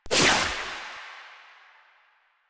Jade Scythe Spin; Weapons; Stalker; Warframe

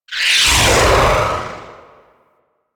Harm Cast B; Warframe; Animus; Anima Animus

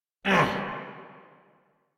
Pain, Grineer Male

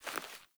Sandals Walk Vegetation, Footsteps; Characters; Player

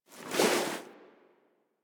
Reload Grineer Cannon Foley Start

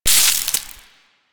electricity trap loop stop; Venus; Warframe; Veilbreaker; Quests; Kahl

